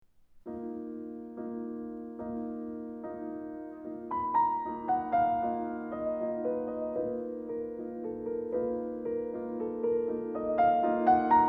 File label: Andante Con Moto, piano